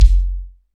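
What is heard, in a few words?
bass drum, Roland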